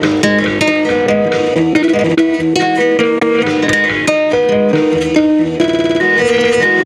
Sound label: guitar